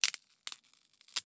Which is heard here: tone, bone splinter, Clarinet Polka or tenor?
bone splinter